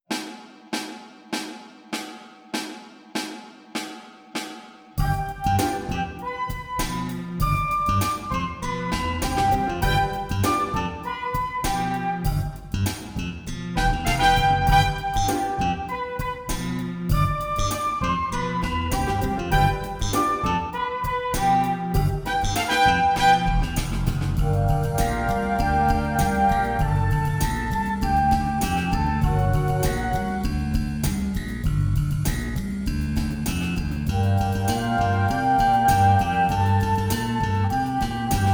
Recorder; Learning Speed

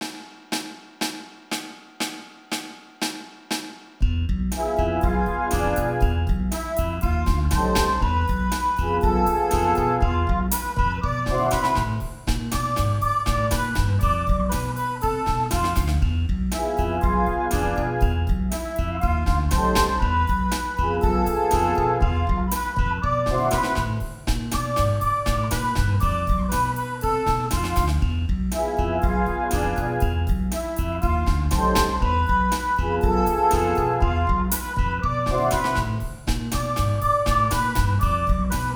Recorder

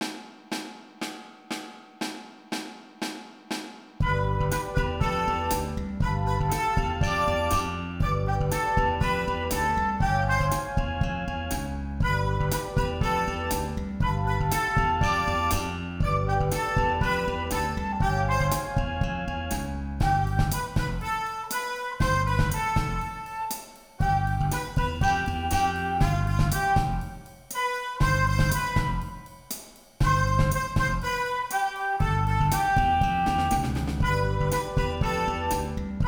Cool Bass

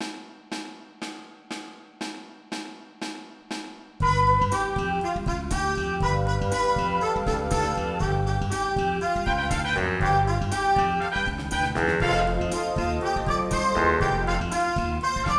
Recorder is so Hip

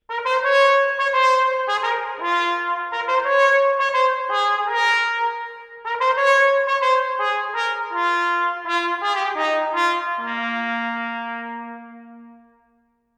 Original Trumpet Clip

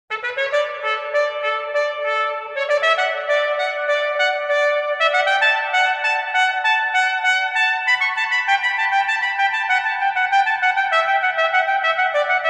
Toccata

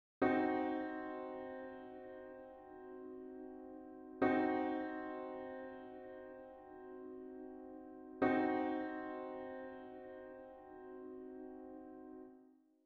Diminished Chord

